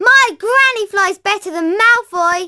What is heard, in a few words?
Crowd